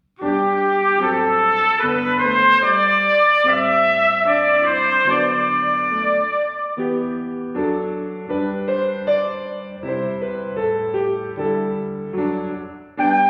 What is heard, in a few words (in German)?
Trompete und Klavier; Gruendonnerstag Abend; Klavier Ulrich Roth